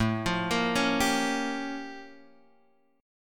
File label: A Augmented 7th Flat 9th A; arpeggiated; 1,3,sharp5,b7,b9; JGuitar